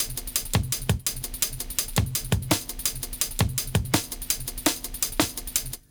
LOOP, Explosive Jungle; USB Soundscan vol.05; AKAI

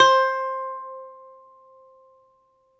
BALALAIKA, Prima Balalaika, Plucked Strings

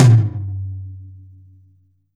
tom, Percussion; realdrum

